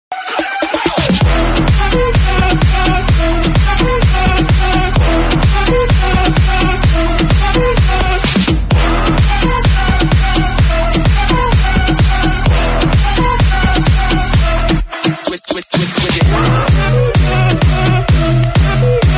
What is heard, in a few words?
Mobile Ringtone